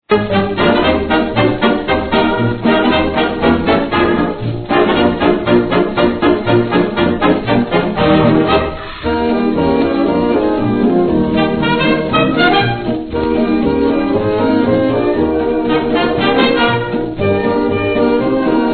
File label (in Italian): Orchestra Jazz